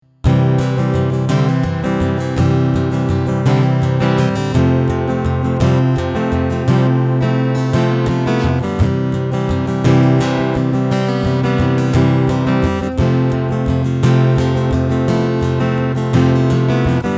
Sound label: Epiphone J-45 Strumming EQ Center Position; November Burst